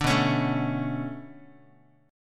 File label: B-Minor 9th B down Guitar; Bm9 strummed; 5,7,4,7,x,x; 4A,4E,5A,5D,5G#,6C#; JGuitar